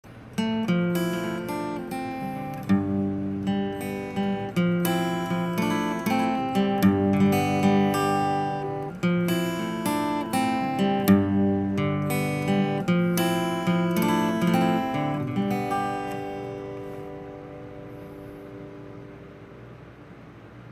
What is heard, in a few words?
guitar lines